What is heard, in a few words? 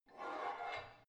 barbell heavy plate pull out